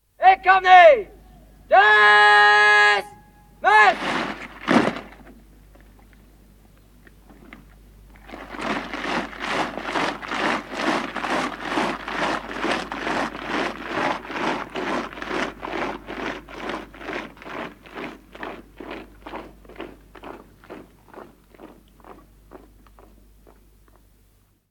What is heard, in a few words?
Army Marching; BBC